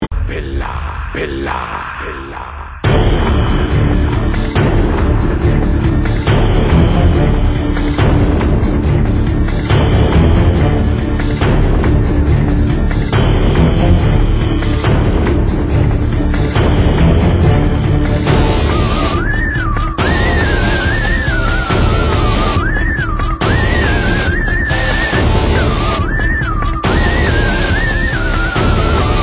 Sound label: theme music ringtone